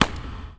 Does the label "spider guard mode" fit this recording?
No